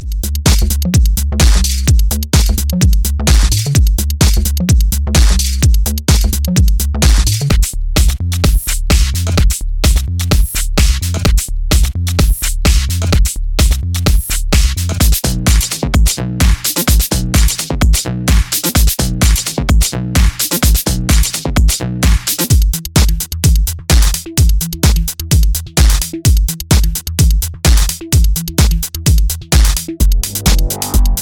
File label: tech house beats